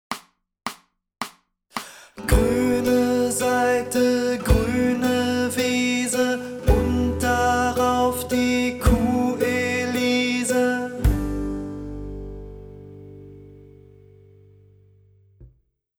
Gruene Saite

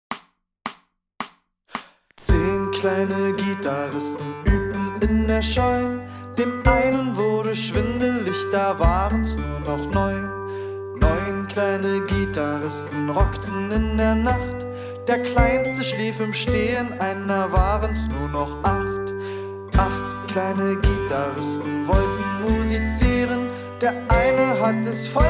Zehn kleine Gitarristen

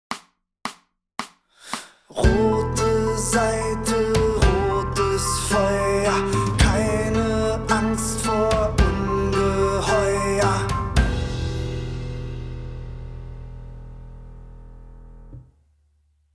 Rote Saite, Gitarrenschule; Titel 17 – Rote Saite

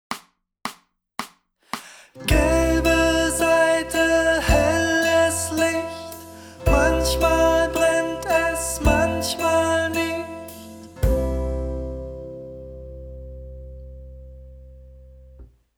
Gelbe Saite; Titel 1 – Gelbe Saite

